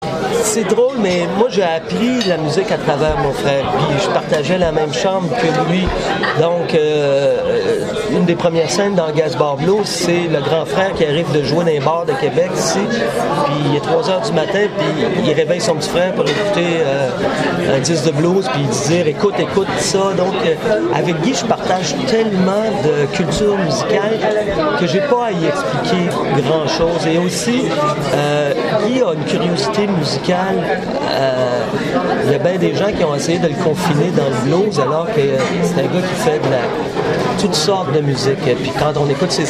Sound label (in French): musique